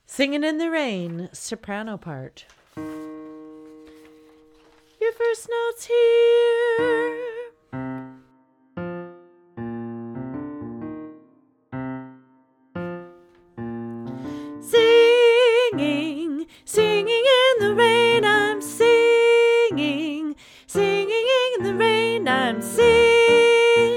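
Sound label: sop, Practice Tracks; berwick-choir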